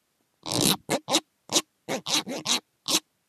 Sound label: guiro